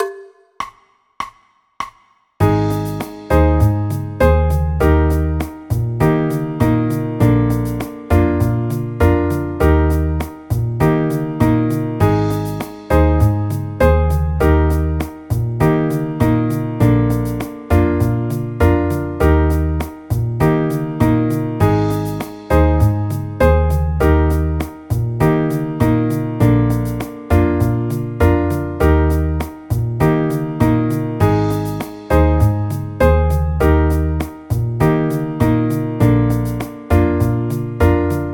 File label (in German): eb dur akkordverbindungen